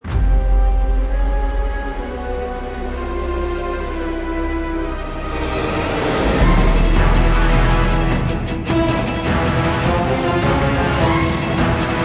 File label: Bridge Collapse; bayonetta 3